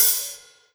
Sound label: Yamaha TG100 Open Hi Hat